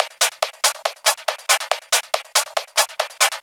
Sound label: Loops 140 BPM Hihat Percussion Loops